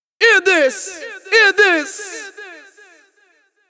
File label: Shouts, сэмпл вокала регги